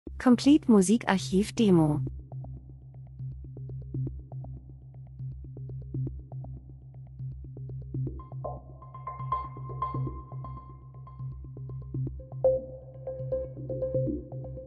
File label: drums; Elektro Percussion Gleichförmig Spirale Kalt Urban Autofahrt im Regen Industrie Maschine Dokumentation